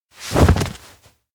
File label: collapse ground; anemoi